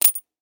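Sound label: red packet button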